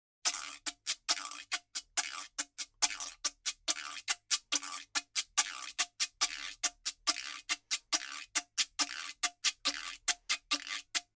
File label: Guiro